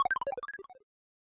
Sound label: Boss Core Hurt Stinger; Avie